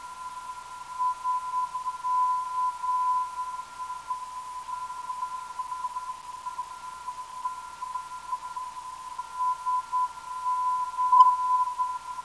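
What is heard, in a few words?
non-directional beacon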